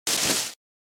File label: fist; attacks